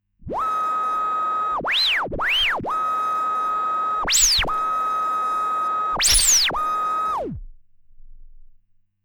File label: MixVibes timecode backspins; recorded with Mixxx in pass through mode